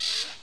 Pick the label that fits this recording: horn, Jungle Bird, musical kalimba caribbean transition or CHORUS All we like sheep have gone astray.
Jungle Bird